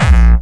bombo, kicks, Hardcore

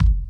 kick

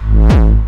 Long Bass

